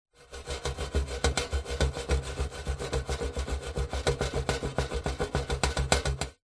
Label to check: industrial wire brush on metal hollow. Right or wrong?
Right